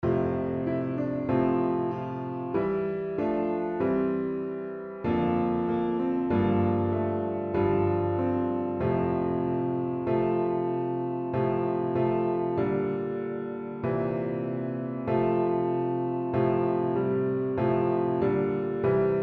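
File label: first steps french horn without solo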